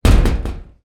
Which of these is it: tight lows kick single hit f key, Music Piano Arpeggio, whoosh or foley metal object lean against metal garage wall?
foley metal object lean against metal garage wall